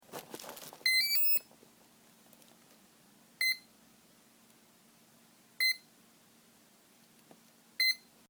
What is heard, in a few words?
bips tricopter